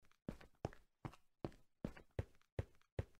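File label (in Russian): shagi po betonu, footsteps on concrete; minecraft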